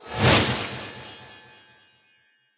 Frog Infuser Crystal charge Loop Start

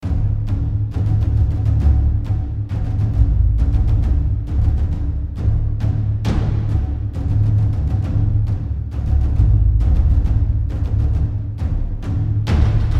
Battle Intensity

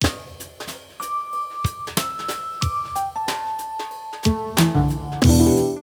Billiards Intro; Super Monkey Ball

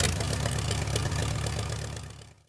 Airplane; The Denpa Men; Android

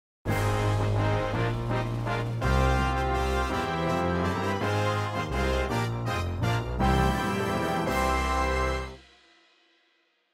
Success; Rock Climber

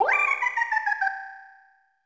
Laughter

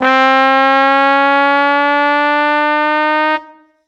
Trombone Slide Long; super crazy rhythm castle

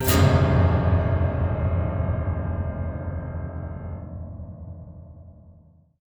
Piano Bad Note Stinger; Layers of Fear Inheritance

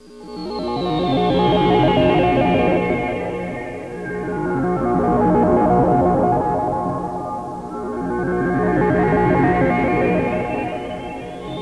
Pad; Great Eagle 2

